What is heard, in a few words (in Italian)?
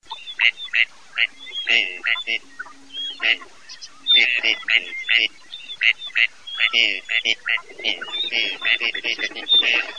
CANAPIGLIA